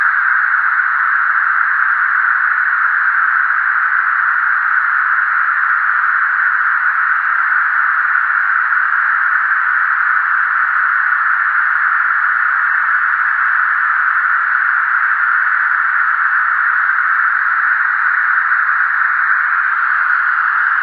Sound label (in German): Rauschen Hell